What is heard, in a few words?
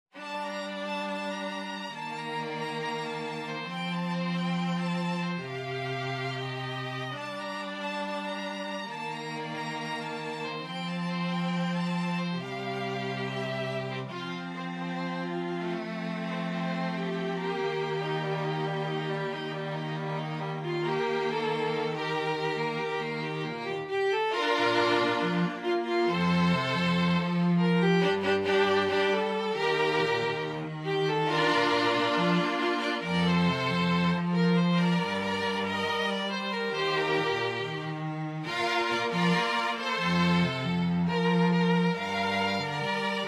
STRING TRIO; Disney Movie